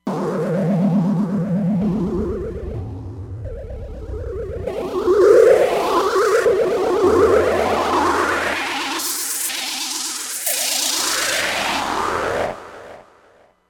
Mean Martian, Roland Fantom XR

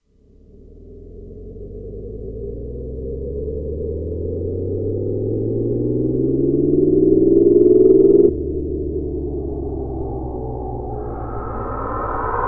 Speedway, Roland Alpha Juno-2; Factory 2

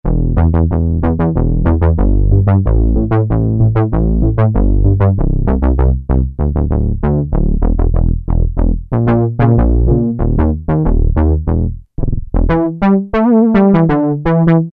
Korg Poly-800, Science Bass

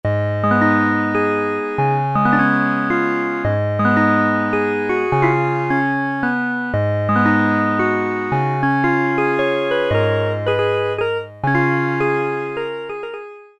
Chimes chord, Korg Poly-61M

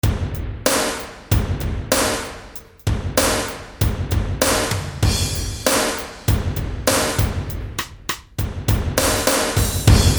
R&R Kit; Roland L-CDX-01 Rhythm Section Instruments

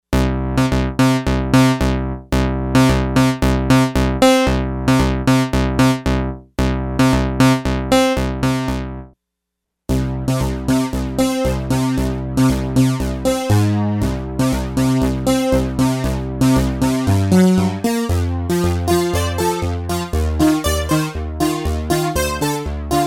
Stereo Spin, Lexicon MPX 1; Factory Programs

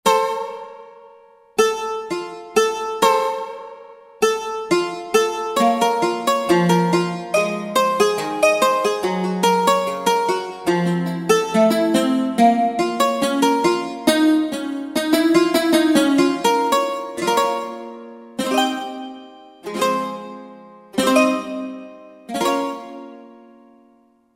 Bouzouki, Roland SR-JV80-17 Country Collection

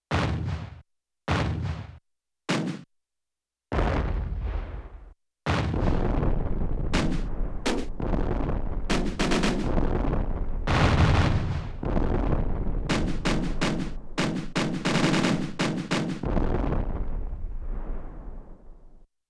Shots; Yamaha TX16W; Sound FX

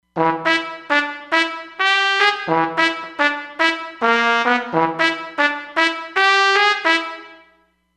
Horn Chops

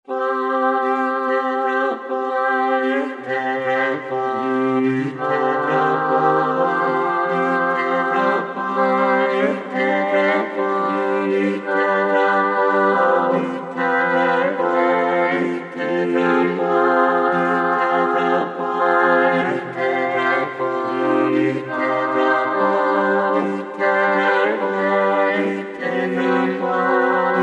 Roland V-Synth Voix Bulgares; Factory Patches

